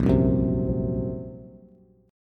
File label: A sharp Suspended 2nd Sharp 5th A sharp, Bass; A#sus2#5 strummed; JGuitar